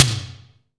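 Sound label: SDS V SNR, snare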